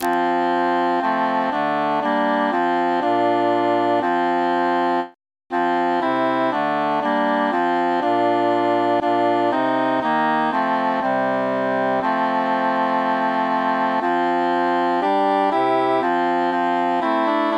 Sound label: cantus mute; o occhi